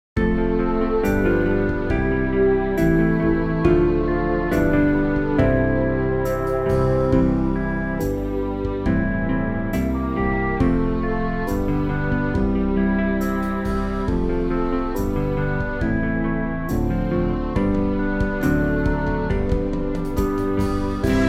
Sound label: Like A Green Tree backing; New Hope Music